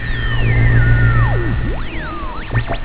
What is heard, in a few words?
radio